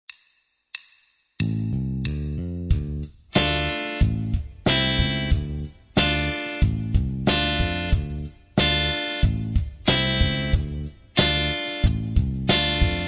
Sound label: Hit the Bass, Playback, Rock & Blues Solos; 10 Rock & Blues Solos; De Haske